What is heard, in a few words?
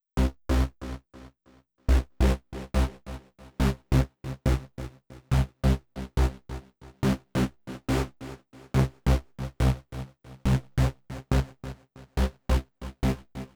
140 House Saw Bass Cmaj; Dance DJ; Digidesign; Pro Tools; Pro Tools Essential Loops